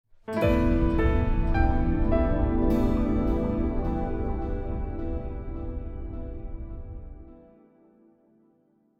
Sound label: contemplative; Joe's Diner